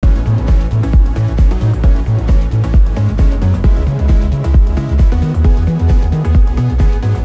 music; cstrike; zp town

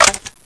xm1014 Shell In, weapons

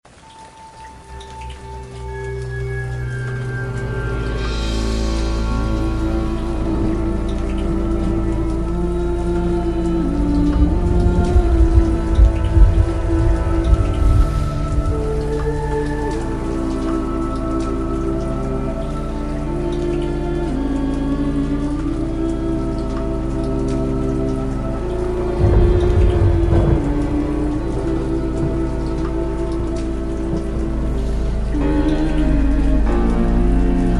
ambience, zombie plague

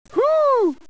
whoo; radio bot

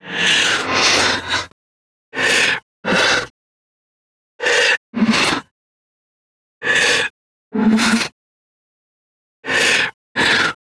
zm idle, zombie; zombie plague

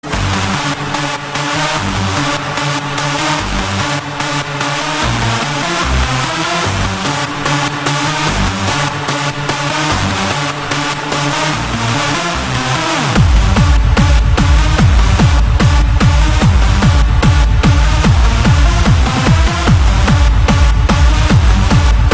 hardstyle

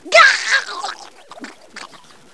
pain; invis

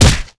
claw hit; oblivion darkangels

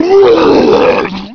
male boomer vomit; zombie plague

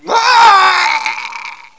death; cstrike; impulse

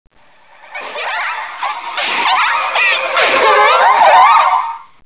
laugh

